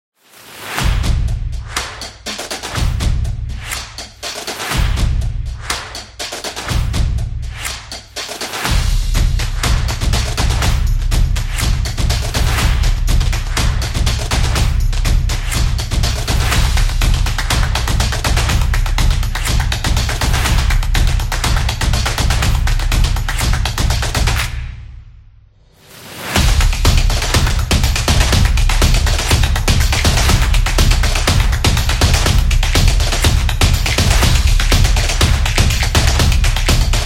A Percussion Beat; high energy

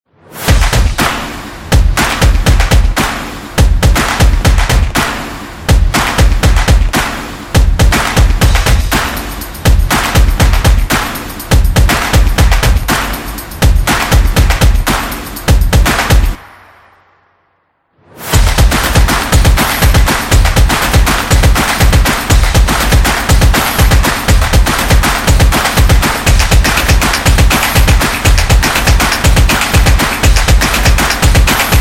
Drumming Clapping Stomping

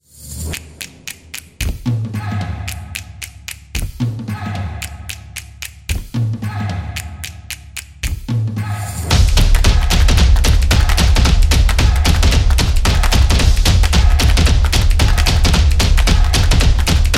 Drums Jazz

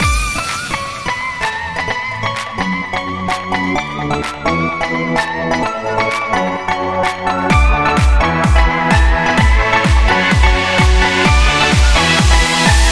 Summer Pop, corporate music; corporate music vol 18

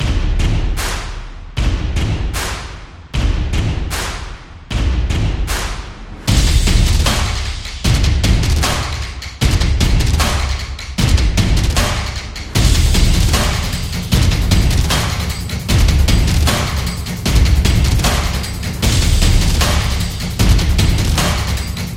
Stomps Claps Drums; percussive nation vol 8

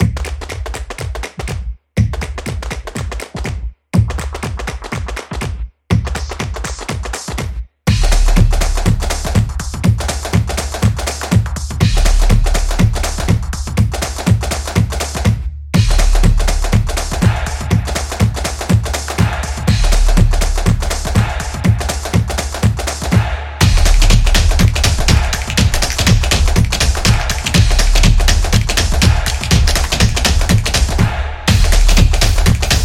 Modern Opener, drumming action

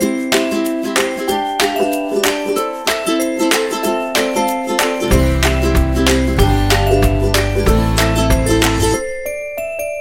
Happy Ukulele, happy music

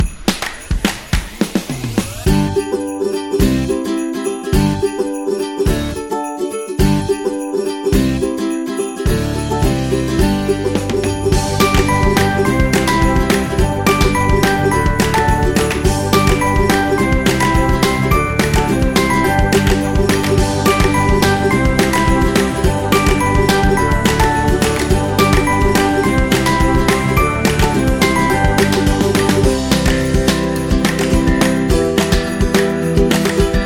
Christmas Upbeat Holiday

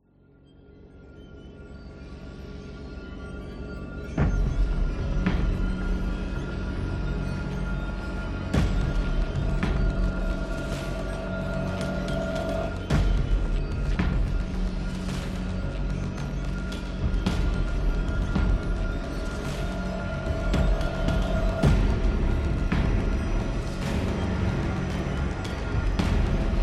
Horror Tension

